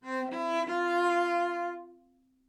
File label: live string phrases, string phrase Cmaj 100bpm; bespoke - Floral v.1